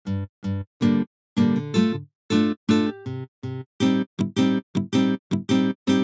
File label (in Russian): krasivyj boj